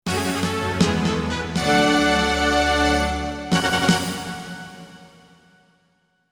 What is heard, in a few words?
victory